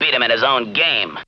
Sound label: VOX